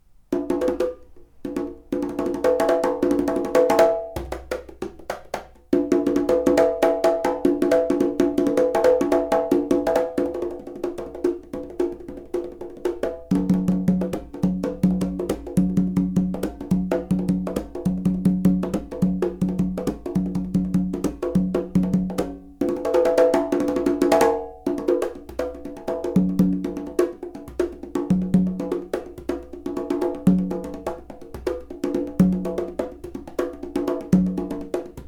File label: conga set white